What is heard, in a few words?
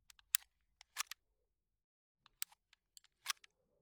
P38, Handling, Removing Magazine, Right, Walther P38 semi automatic pistol; 1m; MKH8060